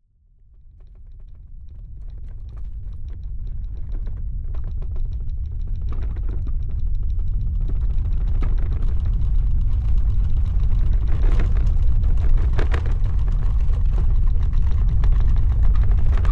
nature earthquake inside house objects shake rattle low rumble